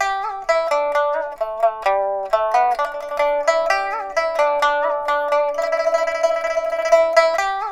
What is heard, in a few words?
PIPA, 90; INIS - Opium